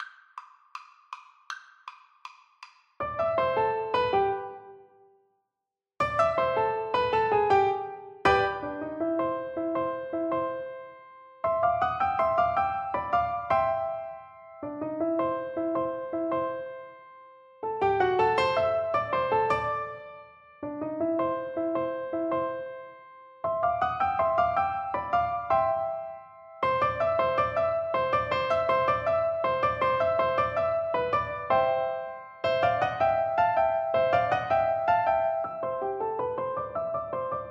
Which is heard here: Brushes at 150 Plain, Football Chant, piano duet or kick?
piano duet